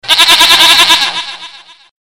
goat funny ringtone

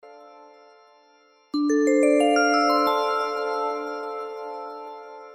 vibes tone ringtone

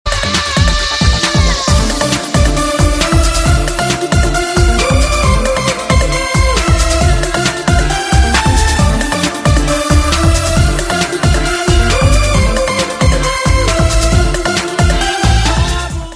cool beat hit ringtone

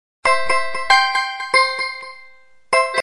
alarm ringtone

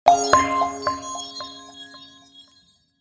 techno mobile sms ringtone

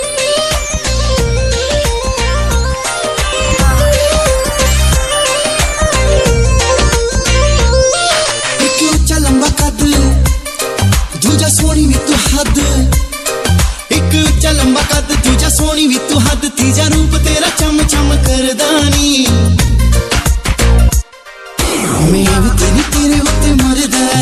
korean mix hindi ringtone

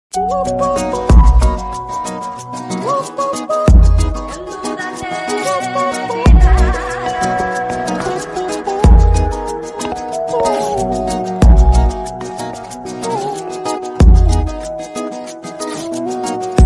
free love music call notification tone ringtone; galaxy ringtones

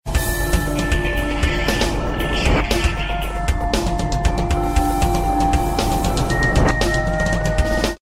soft drum sms tone ringtone

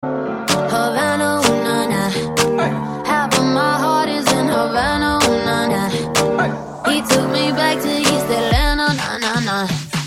bass ringtone

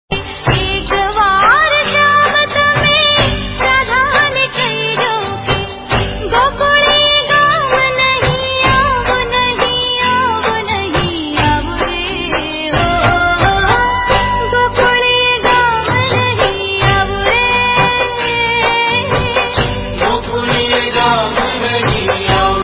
gujrati popular ringtone, bollywood

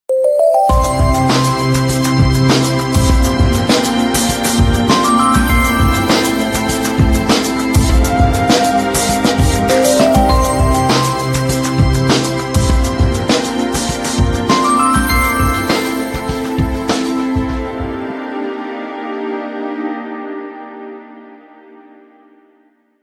samsung galaxy ringtone

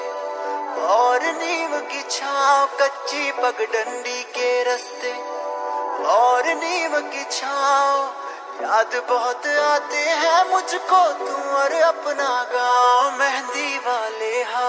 dj remix ringtone

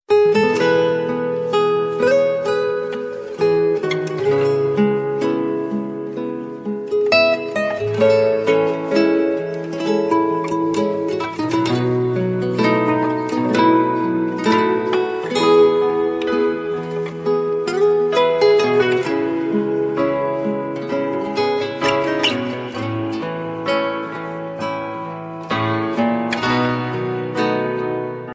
sad guitar ringtone

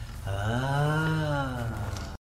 sighing door remix ringtone

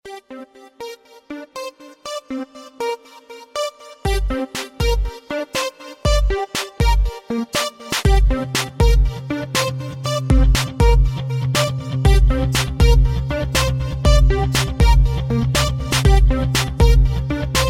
beep dance ringtone

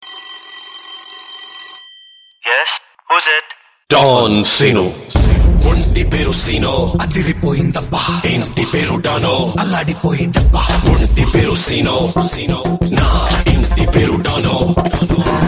song dialogue ringtone

